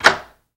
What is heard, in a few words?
Dead Bolt Lock Click; lock & keys